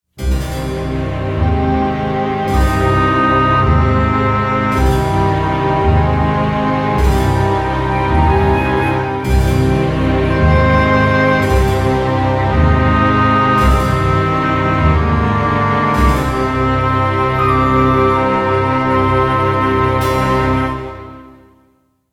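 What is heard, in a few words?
Lose Campaign; Heroes of Might & Magic III HD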